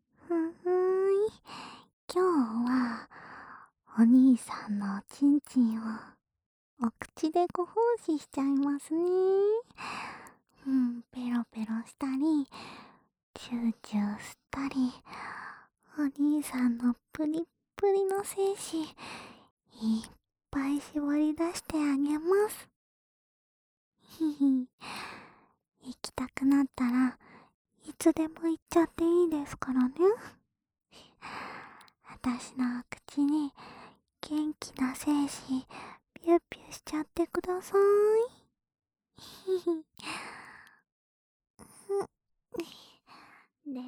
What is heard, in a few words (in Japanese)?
オナサポ用 フェラチオ ループ